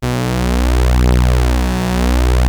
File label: OB 1 B2, synthesizer; Hollywood Edge - Giorgio Moroder Rare Synthesizer Collection; OB 4 VOX.